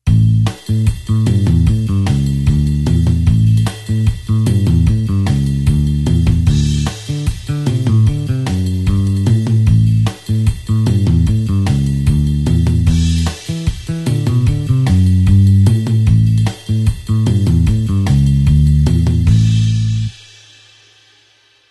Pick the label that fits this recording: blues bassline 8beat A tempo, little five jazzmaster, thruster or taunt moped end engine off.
blues bassline 8beat A tempo